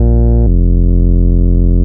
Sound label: BAS, 2 Step